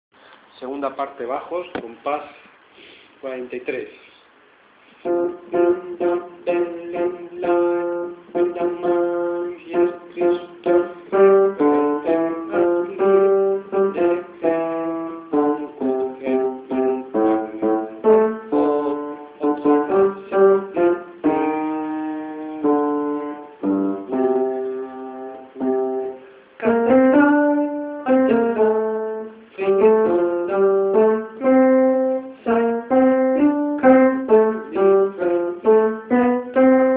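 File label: bass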